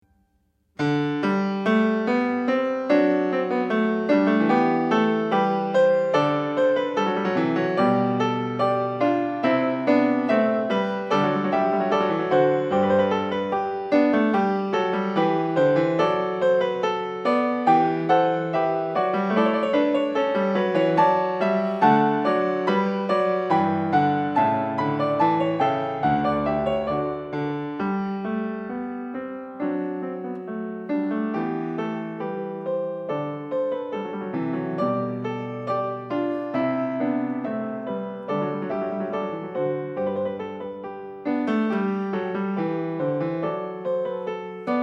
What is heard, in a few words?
Goldberg Variations for keyboard, Variation 30 Quadlibet; Bach, Johann Sebastian; Goldberg Variations for keyboard, BWV 988- Variation 30 a 1 Clav. Quadlibet